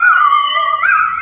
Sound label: Magic ICELOOP